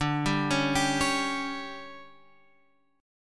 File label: D Major 7th Suspended 4th, Guitar, Open F; DM7sus4; arpeggiated; x,9,7,8,10,8